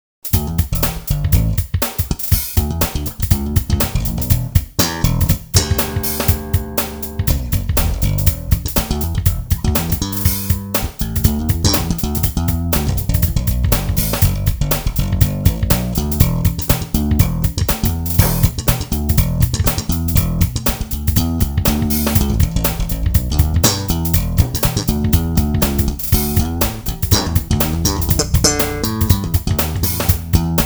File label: Slap solo